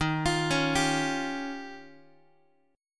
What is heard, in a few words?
Eb 9th, Guitar; arpeggiated; x,11,8,11,11,x; JGuitar